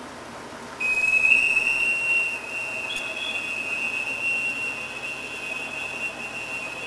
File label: SONAR